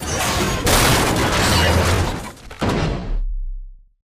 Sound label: melee chest punch; Titanfall 2